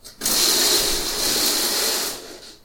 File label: long curtain